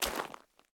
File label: zombie footstep mud; DeadFright